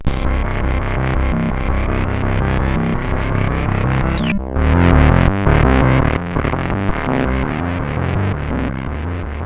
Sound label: intro fx, CSG8580R5